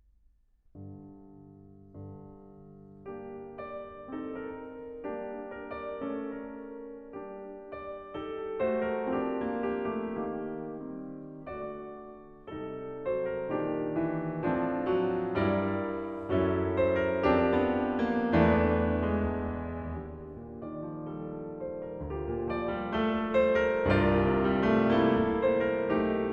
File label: Lullaby, Piano; Norwegian Folk Songs, Op. 66 XVII.; Grieg Complete Piano Works